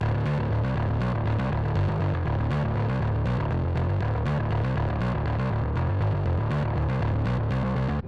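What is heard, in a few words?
wet bass, Multiband neural audio effect; prism distortion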